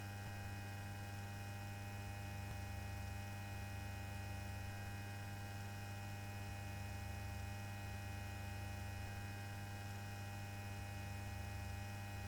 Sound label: Bourdonements Humming